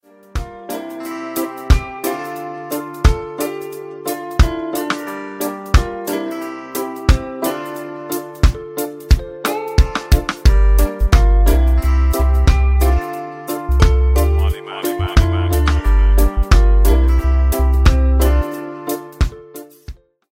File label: drop ringtone; samsung ringtones